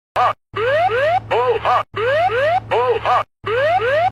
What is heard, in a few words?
concorde pull up